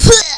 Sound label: bump; openffa; astro